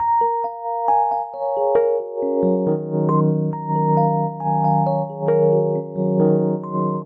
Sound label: Ostinato Descending Eb Minor 68 bpm, Electric Keyboards; MIDI Clips; Ableton; Factory Packs